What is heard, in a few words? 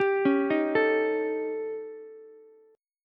D sharp 7th Flat 5th, D sharp, down, Ukulele; arpeggiated; JGuitar